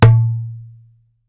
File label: darbuka note